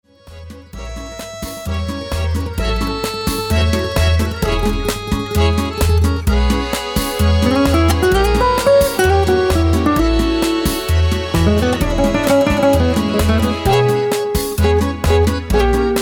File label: Electro Tango Remix Instrumental